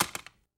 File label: plastic impact; ping pong gun; Toybox